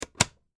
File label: Wood Box Close, Small; Foley; Materials; BlastWave